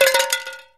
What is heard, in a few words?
conserva; STALKER; IWP